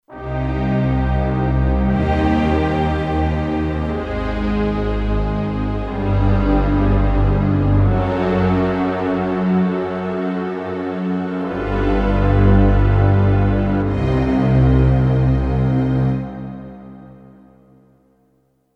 Slo Orchestra, Kurzweil K2500X

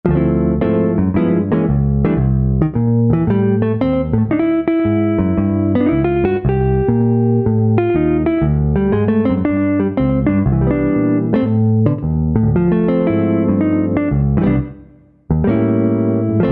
JAZZ GUITAR, Roland SC-880; Factory Patches

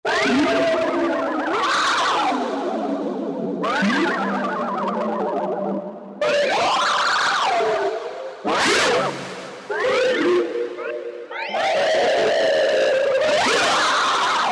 Roland JD-990; CrazyHorse; Factory Patches examples

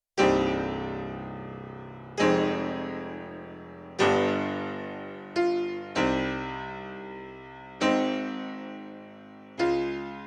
Classical Grand Low, Yamaha CFIII Grand Piano; Yamaha TX16W

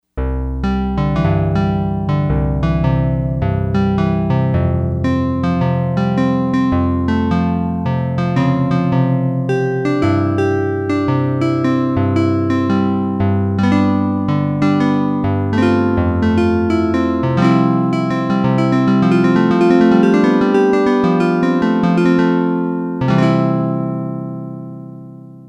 Folk Guit, Yamaha DX100; Factory Patches

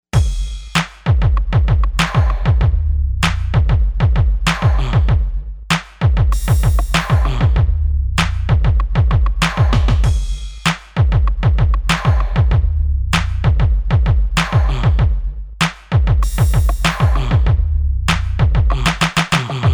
AKAI XR20 Factory patterns

